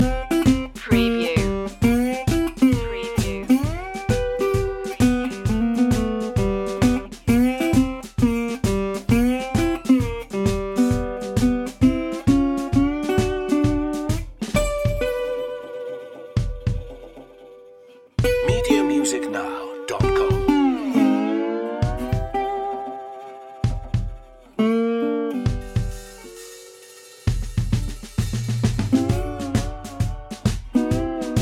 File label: belly up blues loop